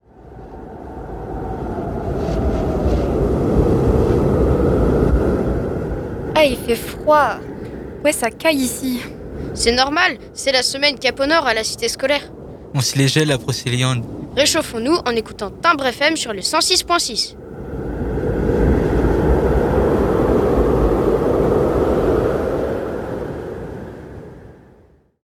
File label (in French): JINGLE BLIZZARD; Cap Au Nord; Cite scolaire Borceliande